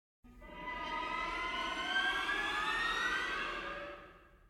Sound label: scare FX; hot lava; ios macos windows